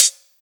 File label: Hat; 7rystan's 700+ FREE COLOSSAL EDM SAMPLE PACK; Percussion; HiHats